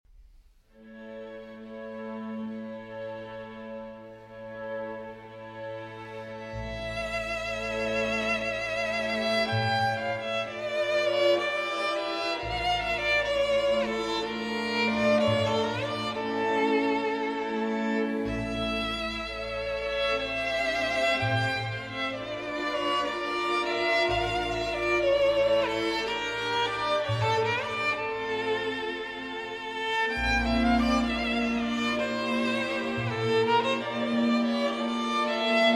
Romanian Folk Dances; BB 68, Sz. 56; Bartók; No. 4, Buci

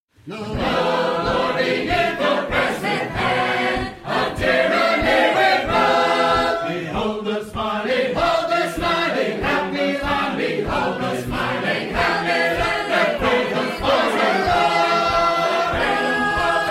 Sacred Harp Singers From Western Massachusetts

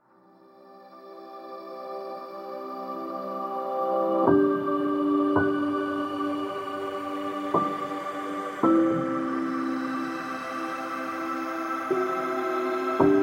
Piano Ambient

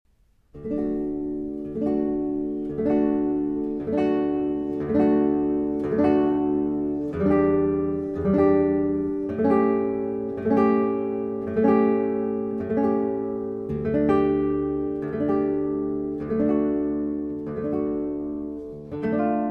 Concerto in D Major, Larghetto; J.S. Bach; Concerto No. 1; BWV 972 (After Vivaldi RV 230); Arr. Perroy

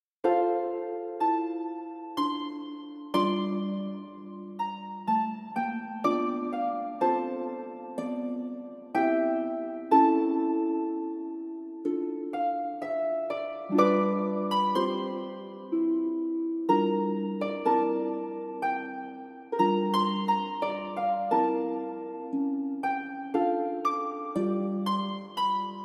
Intermezzo, Harp

